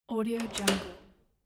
bathroom door thumb turn old lock open

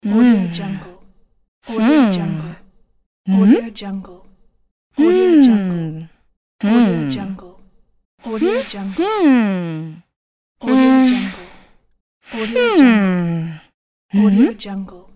woman mmm expressions pack

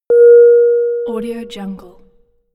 airplane ding; soundjay

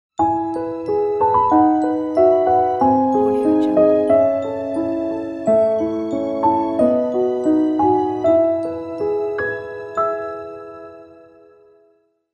a romantic logo

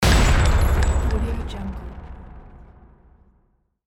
shot

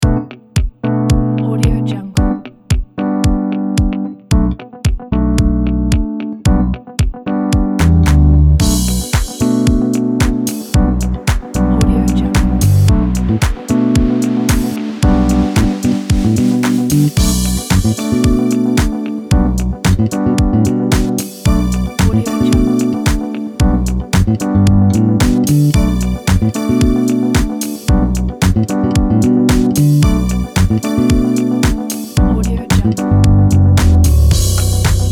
summer disco

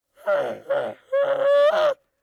baby camel; prosoundfx

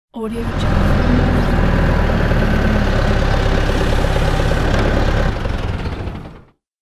tractor arrival

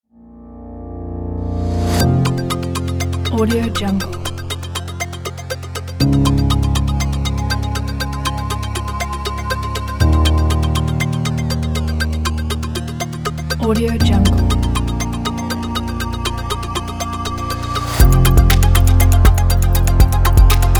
background corporate technology ambient

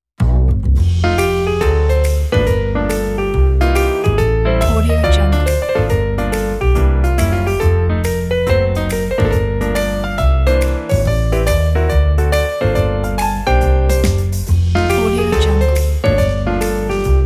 corporate jazz swing

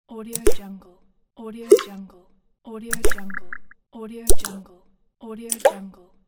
virtual button; volkovsound

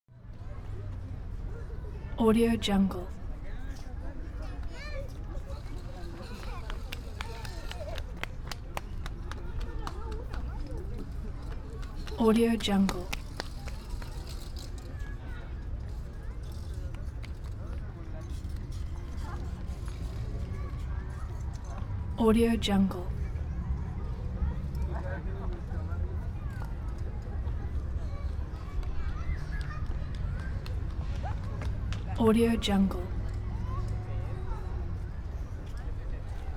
mexican fiesta ambience

